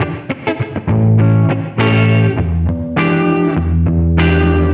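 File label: distorted guitar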